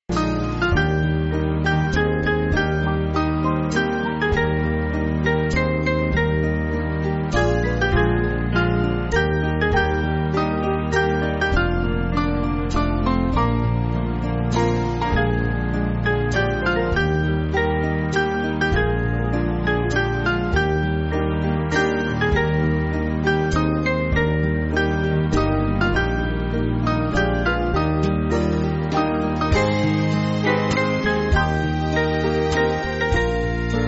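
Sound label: Band, Music Backing Files for Churches; Lift Him Up; Hakes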